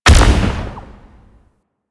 Note Projectile Launch; Warframe; Bard Quest; Bard Boss Fight

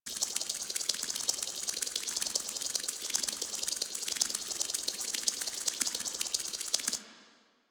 Last Portal Open Clock Loop

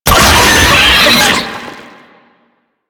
Brachiolyst Pain, Vocals; Enemies; Warframe; Proto Infested

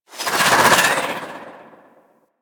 Spear Projectile; Weapons; Warframe; Tenno; Priest Spear

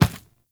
Medium Run Default, Footsteps; Enemies; Warframe

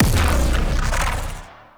Biped Stomp; Warframe; Corpus; Ostrich; Shockwave

